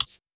soda lock, ui; LG L-Fino

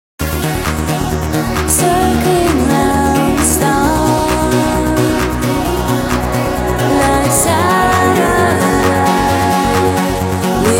Nokia 6260 slide Ringtones; Always here

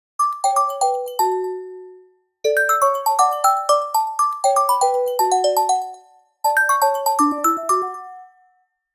Nokia Cadenza; ringtones

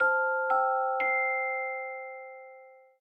Bells; Samsung; Galaxy A56; Calm

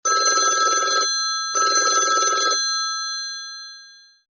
Classic Bell; Samsung; SCH-U650 Sway

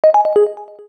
Inactive, keytone; Samsung SGH-A257

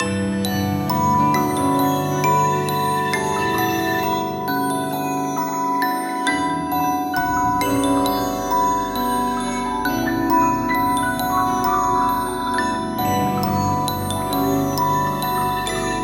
Water Waltz, alarms; Sony Xperia A SO 04E

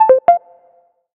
Simple, notifications; Realme 8

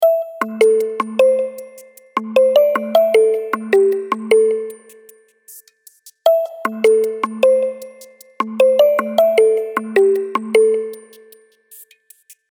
Melodic Wonder, alarms; Alcatel Pixi 3

